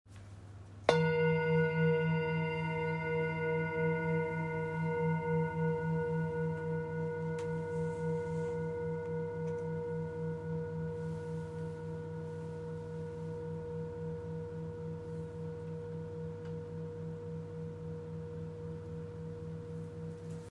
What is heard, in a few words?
bowl, singing bowl; 19cm; Polished Harmony Bowls